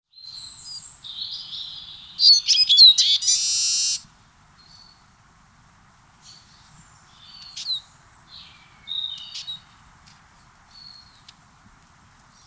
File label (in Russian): penie chizha